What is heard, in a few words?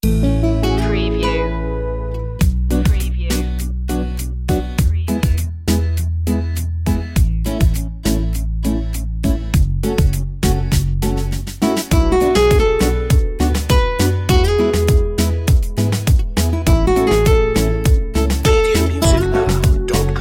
hot and smooth reggaeton